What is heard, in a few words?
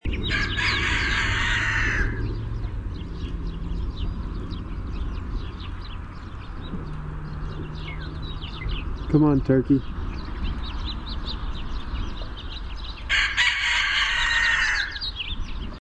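Chicken Crowing Call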